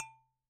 Bottle Struck

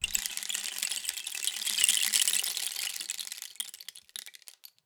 Rain Stick, Percussion Samples

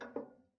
Ping, Kitchen Toaster

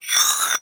Hole Saw Scrape; Hole Saw Bits

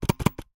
Camera Sequence

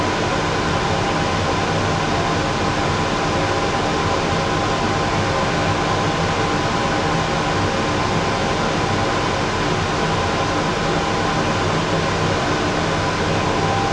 Extractor Loop, Extractor Fan Kitchen; Extractor Fans

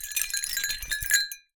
Cowbell Chain, Percussion

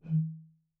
Bottle Blown

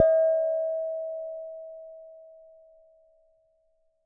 Struck Vase

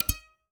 Classical Guitar Extended Technique

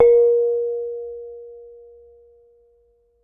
Kenong, Gamelan

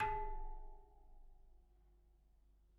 Bicycle Spoke

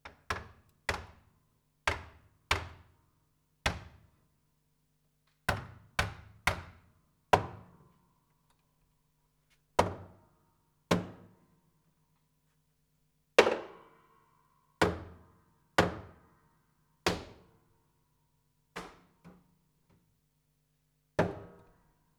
Body Thump Sparsish, Piano Destruction

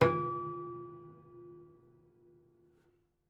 Prepared Piano

